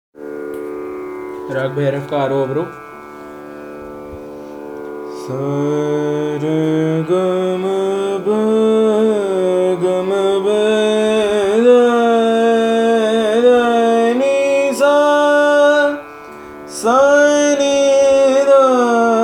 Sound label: Raga Bhairav Aaroh Avroh